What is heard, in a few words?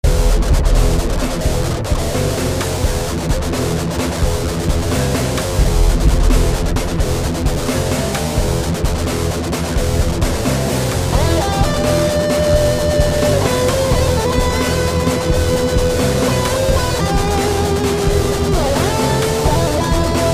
music battle; Ioncross Total War